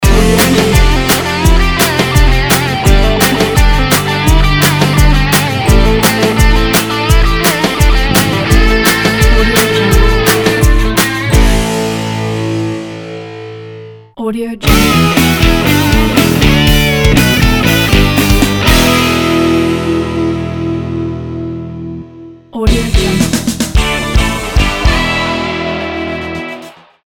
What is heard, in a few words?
rock logo pack